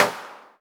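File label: clap mono, drum machines; 300 Drum Machines; E-MU Orbit-3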